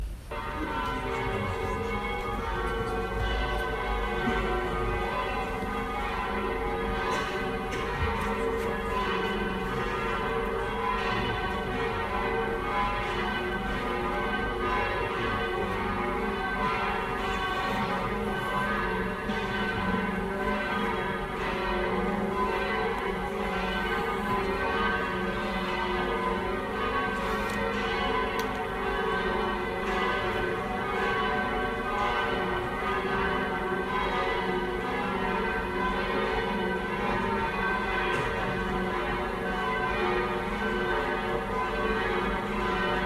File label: Bell